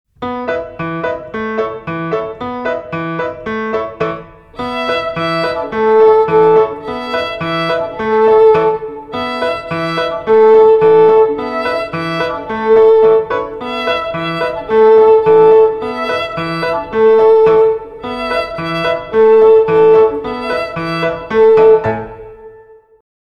Serra, Serra, Serrador (Instrumental), Violino; Violino para os Pequeninos

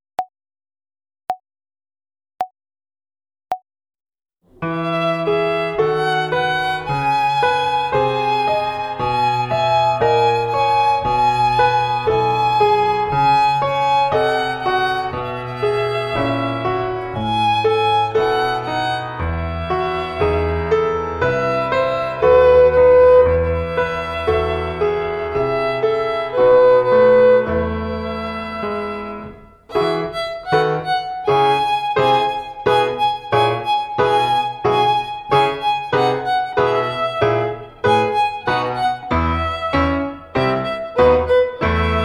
Instrumental, Violino; Violino para os Pequeninos

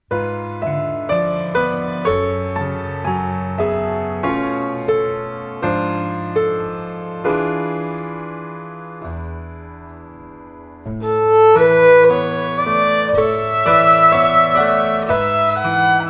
O Tocar Meu Violino (Instrumental), Violino; Violino para os Pequeninos

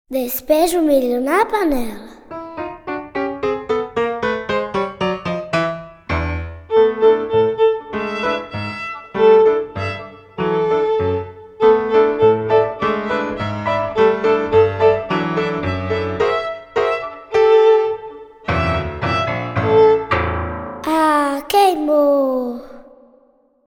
A Pipoca Pula (Instrumental), Violino; Violino para os Pequeninos

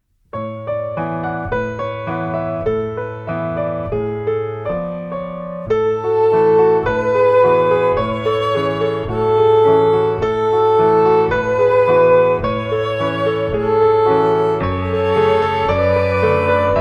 Ao Tocar Meu Violino (Instrumental), Violino; Violino para os Pequeninos

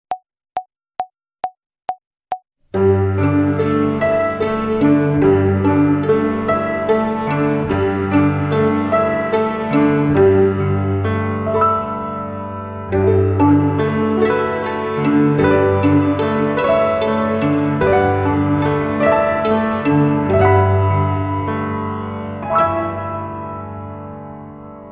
Navegando com o Pizzicato, Violino; Violino para os Pequeninos

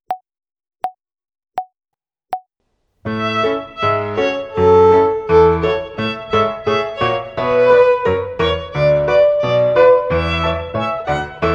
Marcha Soldado (Instrumental), Violino; Violino para os Pequeninos

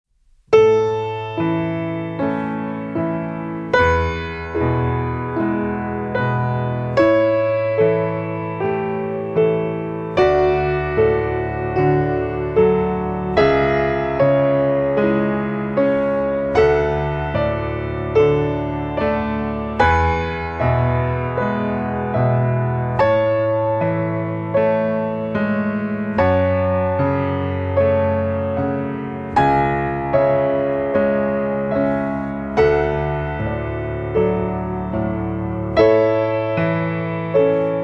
Escala de Lá Maior, Violino; Violino para os Pequeninos

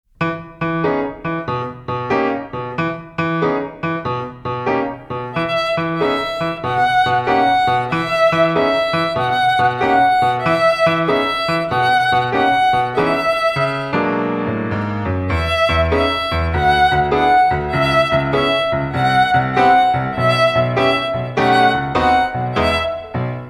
Instrumental, Violino; Violino para os Pequeninos

